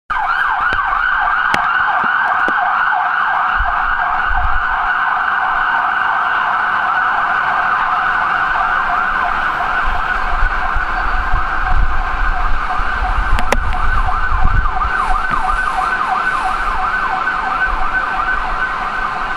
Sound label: sound of se london 1 5 hours before opening ceremony